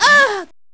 aah

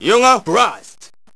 yoga thrust

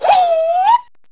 hoowop

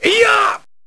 eeyah

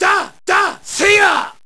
dadaseyaah

